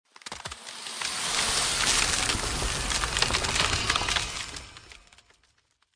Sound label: loader; okc experience